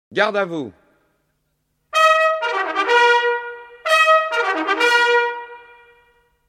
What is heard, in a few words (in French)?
trompette de cavalerie; garde a vous